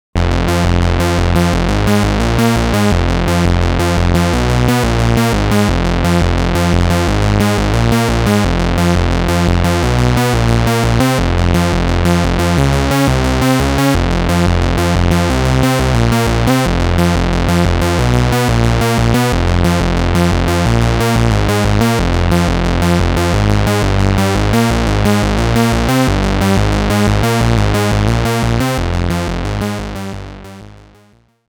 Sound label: bass